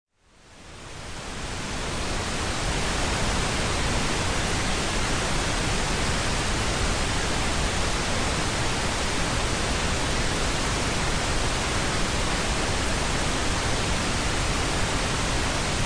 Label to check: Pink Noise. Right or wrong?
Right